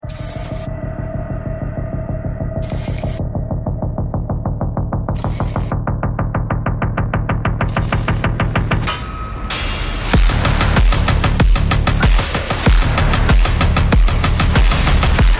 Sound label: dystopian pulse